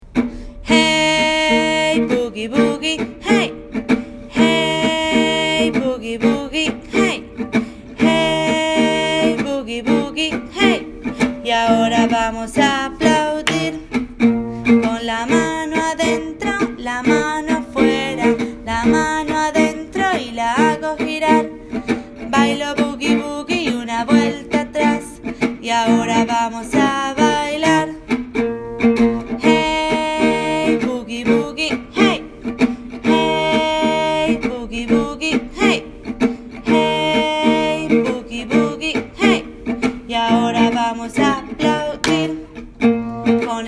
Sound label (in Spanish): Bugui Bugui